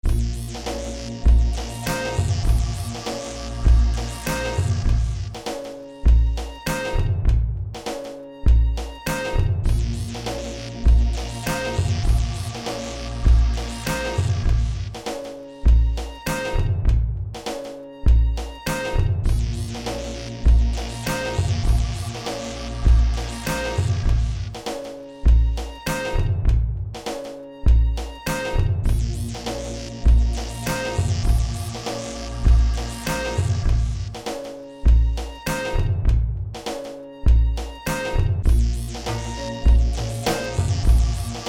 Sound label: A m100 Drum Groove; iDrum